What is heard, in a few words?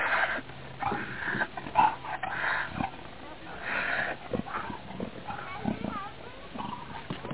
hissy, cat; cathouse